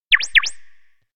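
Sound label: electronic hair twitch; aino; anemoi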